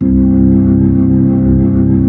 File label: B-3